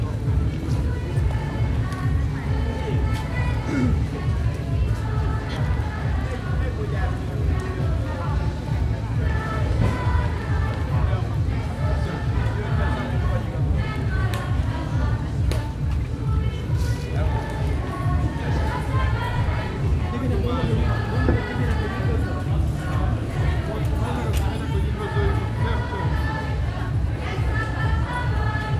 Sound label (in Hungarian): utcabal nyitany; dagaly utca